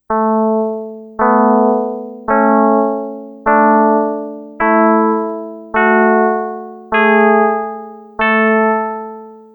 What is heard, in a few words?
Scale Just Chords